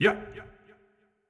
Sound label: Effort Tiny; Marv